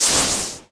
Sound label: Electric Spell Weapon Charge